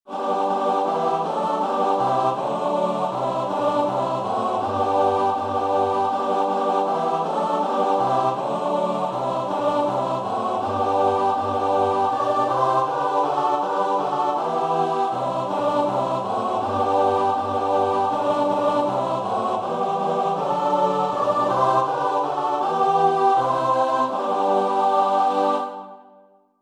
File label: good king, choir